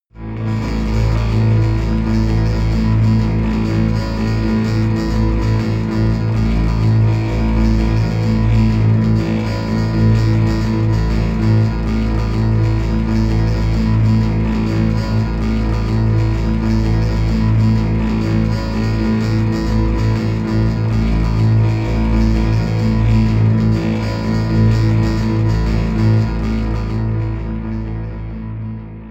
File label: drone erratic pulse